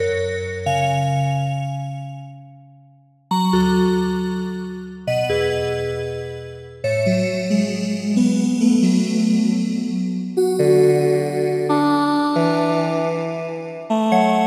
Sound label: ha long melo; porphyra hybrid by ocean swift synthesis